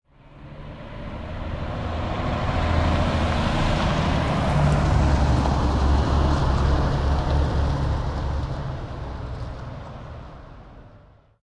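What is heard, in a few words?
car one shot, harbor; Source Engine; tf; cp harbor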